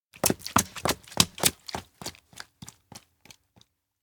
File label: steps outdoor rebuild town dash rain; koyomi; anemoi